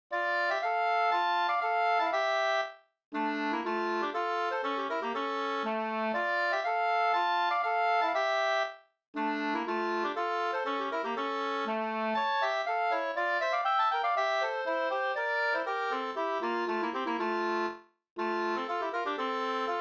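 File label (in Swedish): polska, tvåstämmig; fr Gs Rosenberg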